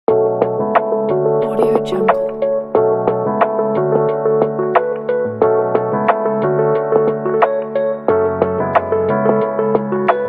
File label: lofi beat